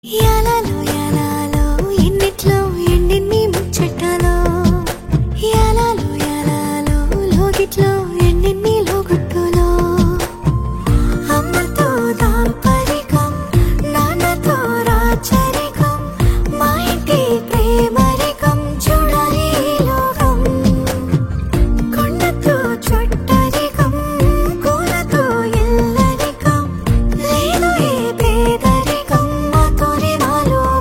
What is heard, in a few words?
Female BGM Ringtone